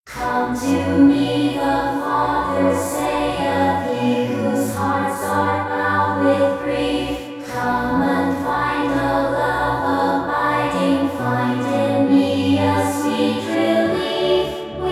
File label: choir